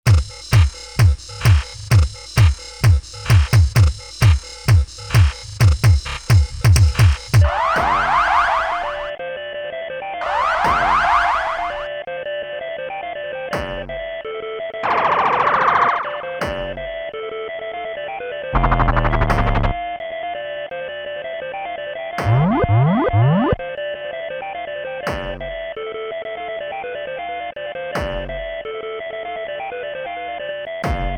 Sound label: hard temple drums